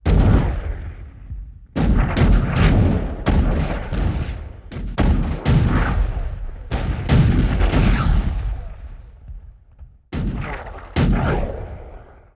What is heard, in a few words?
Cannon Blast Multiple, Period Combat; Sony Pictures Sound Effects Series